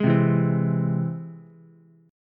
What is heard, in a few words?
E Augmented, Ukulele; E+ strummed; JGuitar